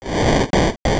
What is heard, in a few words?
fire; mother2